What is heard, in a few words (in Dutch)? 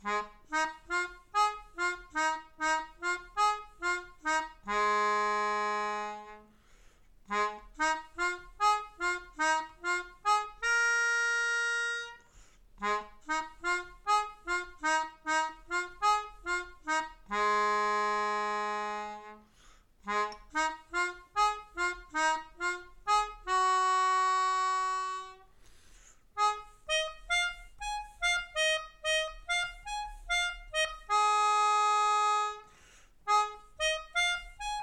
Mijn Melodie, Melodica